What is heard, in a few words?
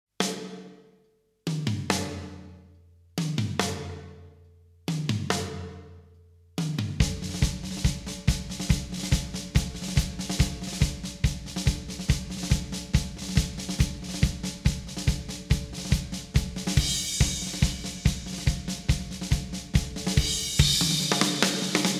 drums; Neon Genesis Evangelion; Evangelion S2 Works